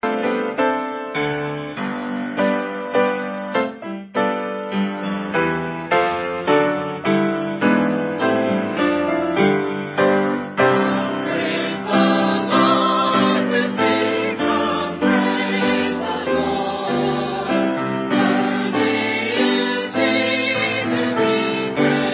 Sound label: choir